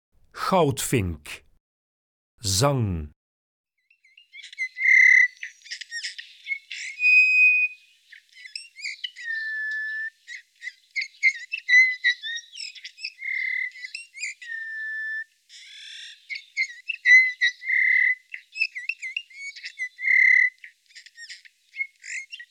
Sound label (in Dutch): Goudvink, Zangvogels; IVN